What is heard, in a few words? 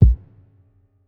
Kit, breakcore Drum Hits